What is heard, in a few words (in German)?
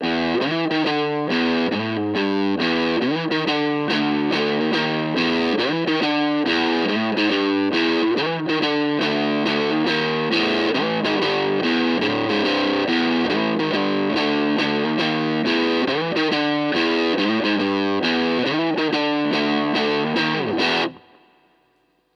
Harley Benton Vergleich HB Fuzz; Maybach